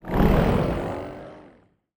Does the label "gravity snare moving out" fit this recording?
Yes